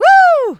Vocals, Adlibs; Houseworx

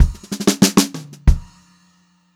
Funk-Groove Drumloops; USB Soundscan vol.38; AKAI

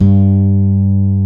BASSES, Roland

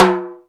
DM5 Perc, Alesis DM5 drum machine

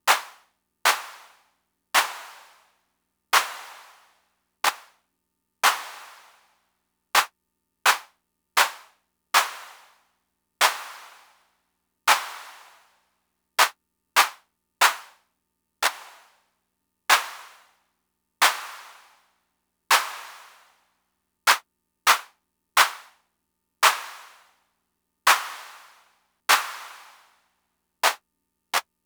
Fricke MFB-522 CLAPS1

